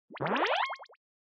Scene Fantasize Dry

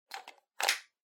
Handheld Transceiver Battery Pack Insert

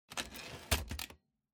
Typewriter Return

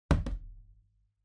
Vacuum Cleaner Put Down

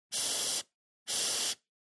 Air Duster

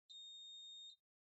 Printer Far Beep

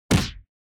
Hit Block Low

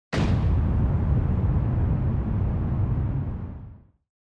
Onoma Rumble Short

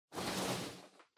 Loose Fill Packaging Far Hand In; 梱包材

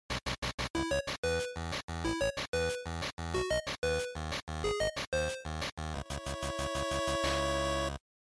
GB Fighting Start

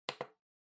Electric Fan (Switch)

